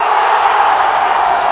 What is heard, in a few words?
cheers